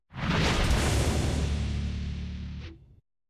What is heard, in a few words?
deploy done, battleship